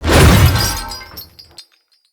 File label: Sword Whoosh Heavy; Weapons; Enemy; Swords; Mnd King Swords